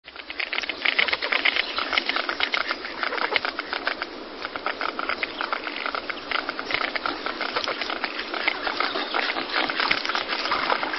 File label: Cinnamon Teal, songs